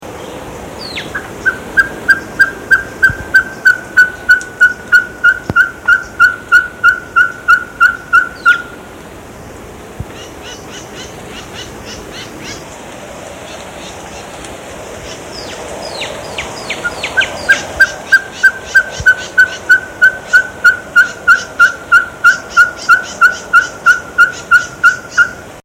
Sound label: ferruginus pygmy owl song